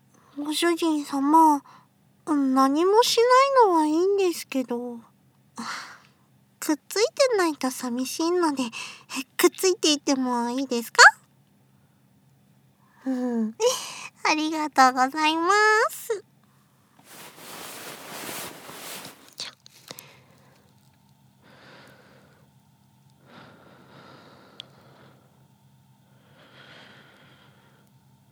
吐息

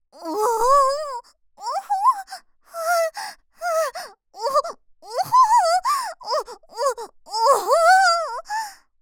おぉぉぉ… おほぉ はぁっ

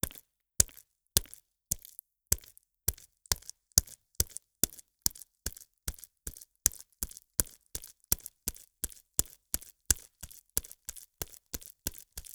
ぱんぱん; 効果音

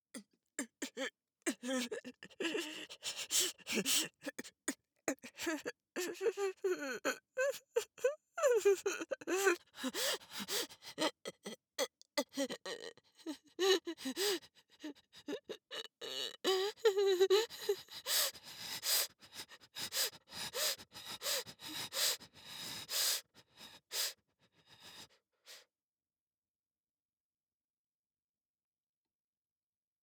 部族 女性 呼吸音

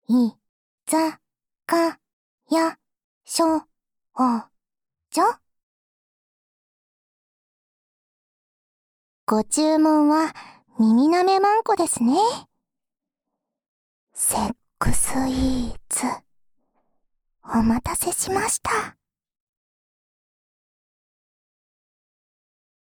耳かきと耳舐め, ASMR; お待たせしました♪

